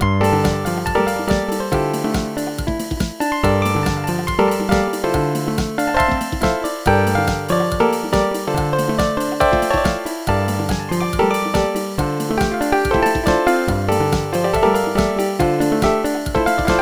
solo; Dr. T's MIDI Recording Studio

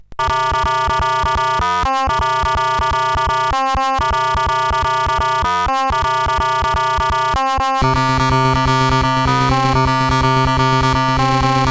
sid CSG8580R5

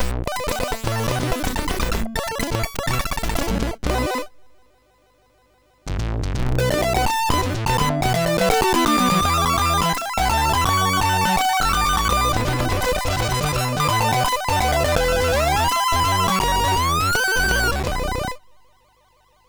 sid MOS6581R3

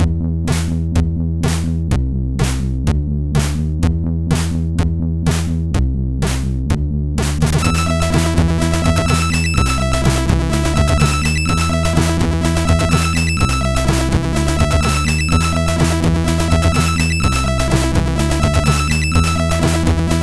Filtr Music sid MOS6581R4

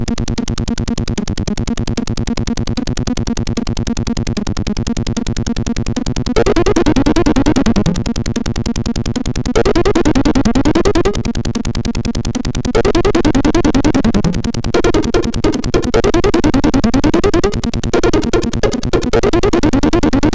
sid MOS6581R4

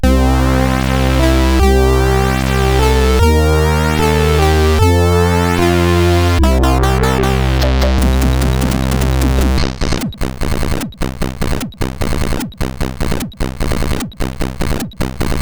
Little Song, sid 8580; CSG8580R5

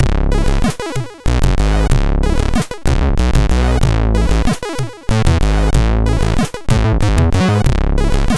sid MOS6581R2

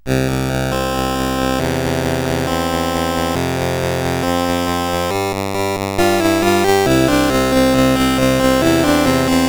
Tank Simulation CSG8580R5; sid

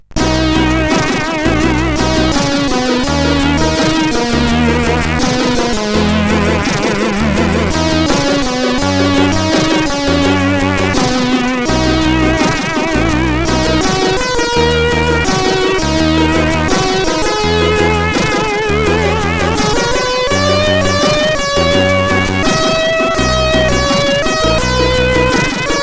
Game Over, CSG8580R5

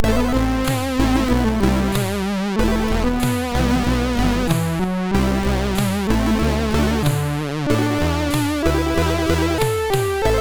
MOS6581; sid; MOS6581R3

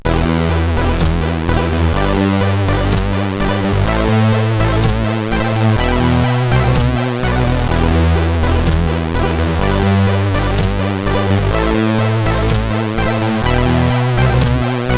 sid CSG8580R5; Theme

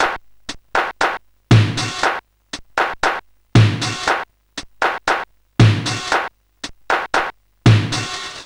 The Clap, Sonic Drum Kit

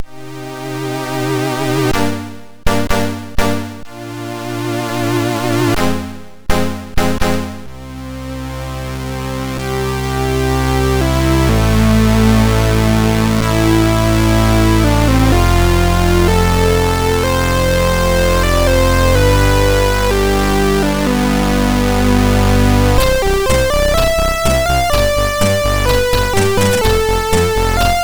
Old Man, MOS6581R4; sid

